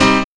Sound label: HIT